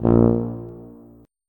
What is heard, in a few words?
tuba note